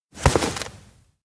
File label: crouch stomach; koyomi; anemoi